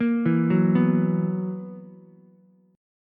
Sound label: B Major 7th Suspended 4th B down Ukulele; BM7sus4; arpeggiated